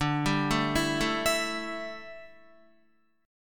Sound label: D Suspended 2nd Suspended 4th D down Guitar; arpeggiated